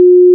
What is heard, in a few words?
end call